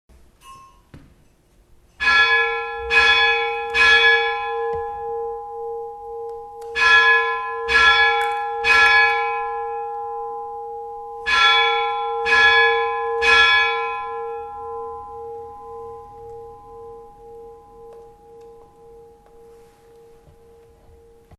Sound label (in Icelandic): reykholakirkja 3x3, kirkjuklukkur